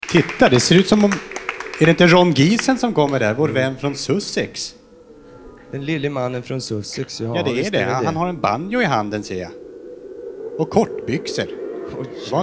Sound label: soundscape and banjo